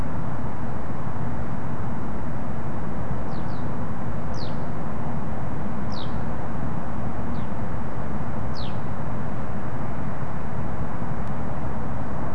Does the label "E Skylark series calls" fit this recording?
Yes